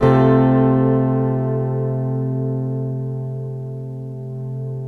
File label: Chapman stick chord; Greytsounds